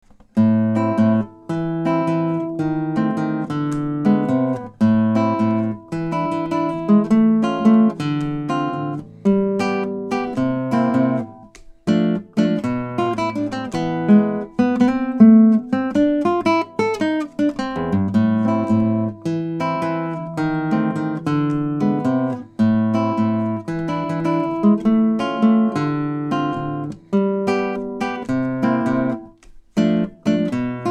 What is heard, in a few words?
Jazzy